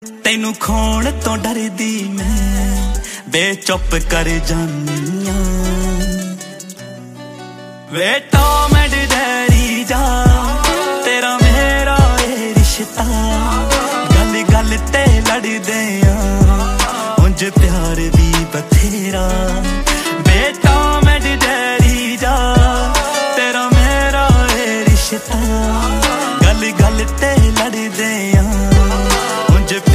Song Ringtone

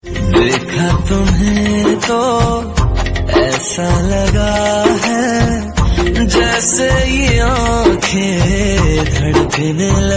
Hindi Love Song

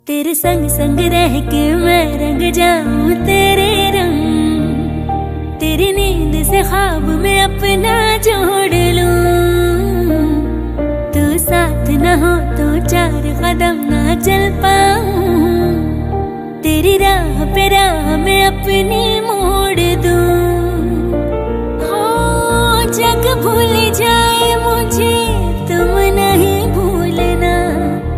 Female Version Ringtone

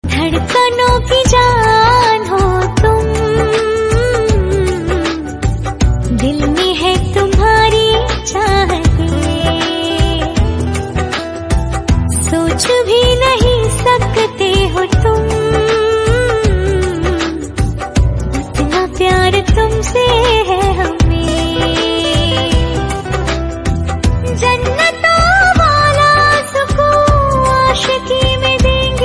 Hindi Love Song Ringtone

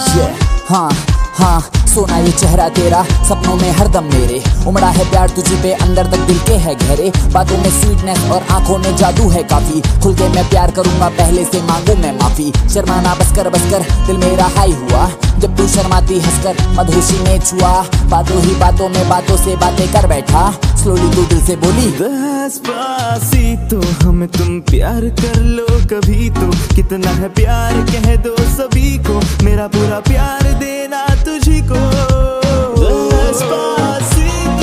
Remix Hindi Version Ringtone

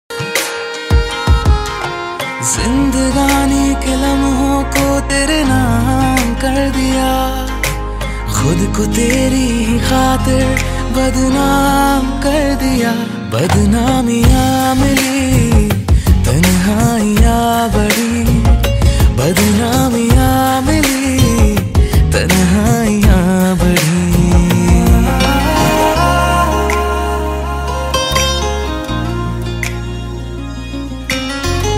New Hindi Song Ringtone

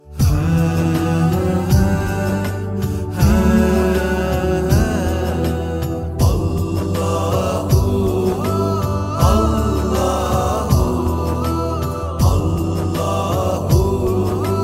Beautiful Islamic Ringtone; ringtones

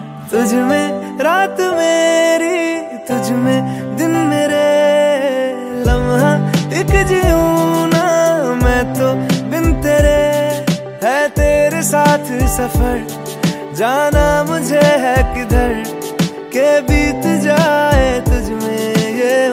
Hindi Love Song Ringtone

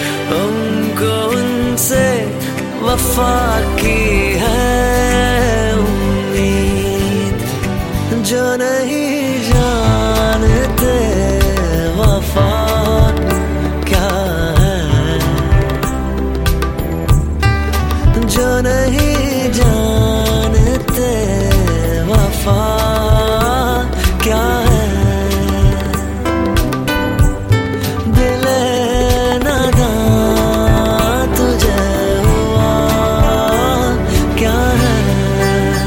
Bollywood Sad Ringtone for Mobile

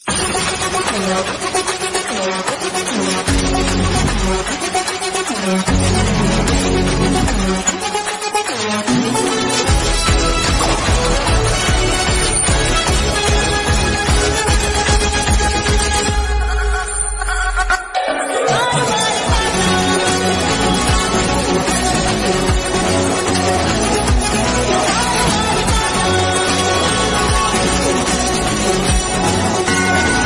Music Ringtone; Sarkaru Vaari Paata

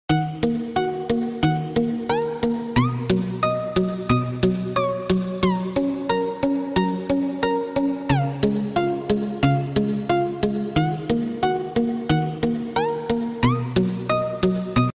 Ringtone For Mobile Phone

